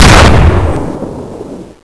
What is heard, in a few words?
big gun